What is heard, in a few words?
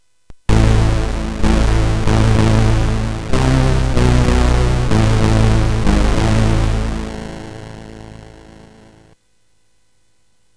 Zap, CSG8580R5; hvsc